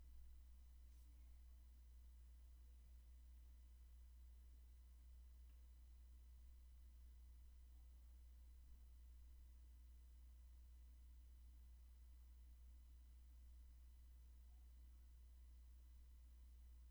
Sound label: room tone